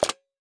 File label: Carriage Return; VoiceOver